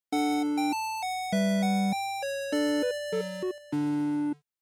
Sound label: NES RPG Inn